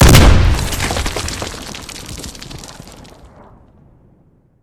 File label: grenade explosion; Escape from Tarkov